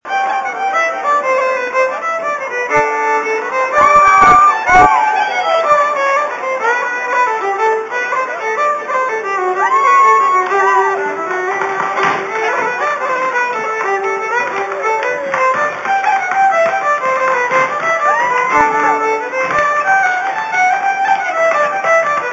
Irish dancing live

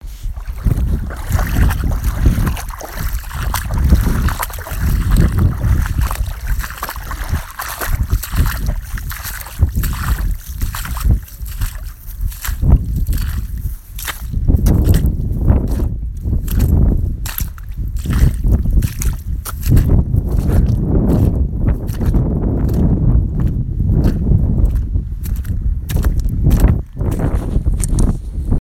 big puddle walk

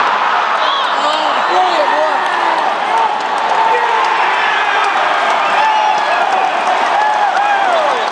score a try, Fans go wild; parramatta eels; theparraeels; nrl

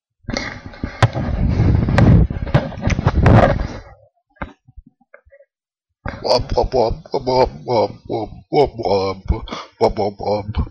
dubstep remixx

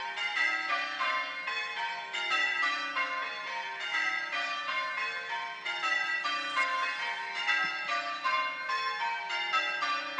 bellringing night in marston oxford

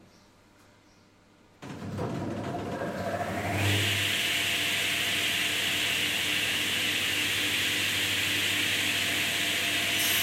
panel saw

